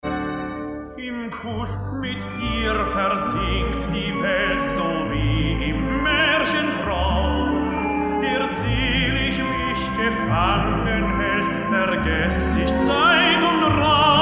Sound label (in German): Duett